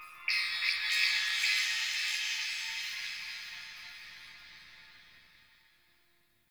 Texture Interior Small, Ambience; Sentient Lair; Warframe

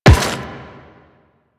Run Metal, Footsteps; Warframe; Quests; The New War; Kahl

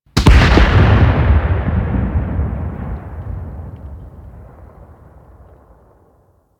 Tower Mining Burst E; Ostron; Warframe; Ambience; Eidolon Plains; Ostron Village

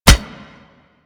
Scythe Catch

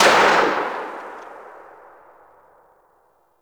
Braton Fire Tail; Warframe

